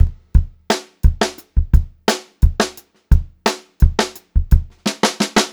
Funk-Groove Drumloops, 87 STRVAR

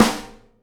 SNARE, Live Snares; PlatinumPhattCD1; E-MU Producer Series Vol. 8 – Platinum Phatt

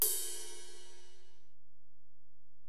Ride, Alesis DM-PRO, Drum Machines

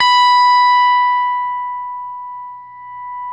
STRATOCASTR

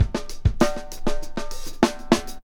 REAL, REAL DRUM LOOP; Ueberschall; Jam Box

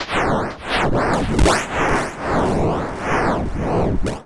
harsh one; One Shot FX; Sample Magic; Transmission-X

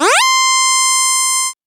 syn c, Dance Synths, Acid Bubble

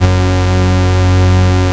OB F#2, VOX, Synthesizer; Hollywood Edge - Giorgio Moroder Rare Synthesizer Collection; OB 4 VOX.

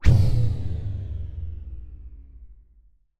sfx sci fi; Masterbits - Switch FX

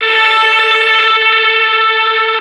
Lead Synth

